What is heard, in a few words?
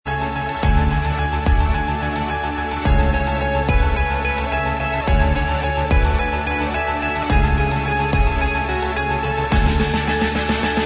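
instrumental track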